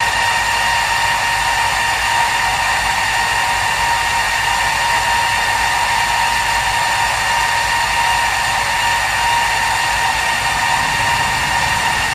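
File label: Petroleum Plant Whine, factory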